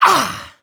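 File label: voice pull loose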